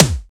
high urban tom drum single hit d key, Royality free tom single hit tuned to the D note.